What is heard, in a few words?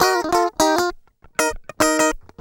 GUI, licks